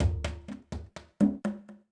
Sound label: PERCUSSN ETHNO 125, Drum